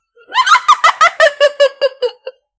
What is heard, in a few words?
neighing giggle happy; animal